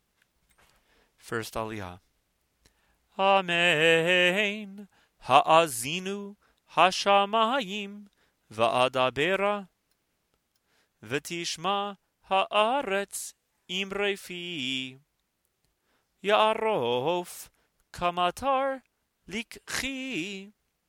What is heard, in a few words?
Haazinu Aliyah chanted